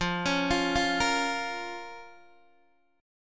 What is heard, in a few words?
F Suspended 4th Sharp 5th Guitar Open D, chord; x,8,11,11,8,8